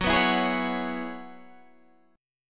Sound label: Gb 9th Suspended 4th Gb down Guitar Open D, chord